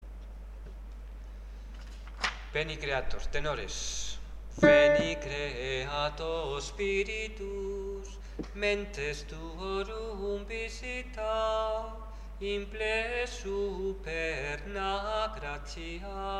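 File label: veni creator 4 voices tenor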